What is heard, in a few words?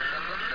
backgrn